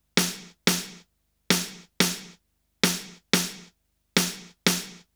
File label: Snare Loop; Sonic Foundry (Sony Creative Software) - Fluid Dynamics Drum & Bass; Construction Kit